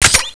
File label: scan activate; cso; x-15